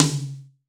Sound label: AMB TOM M, GM DRUM SET; AKAI S-Series CD-ROM Sound Library VOL-8